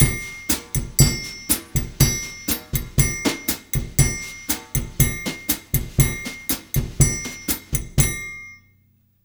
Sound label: 120 BRUSH, Brazil Bossa; USB Soundscan; AKAI